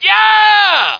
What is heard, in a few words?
yeah